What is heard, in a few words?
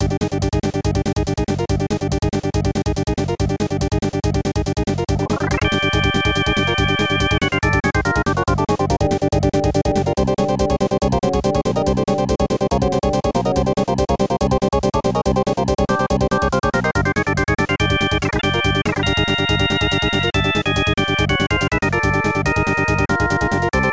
Big Boss BGM; SMW2YI